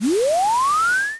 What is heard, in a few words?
disc spin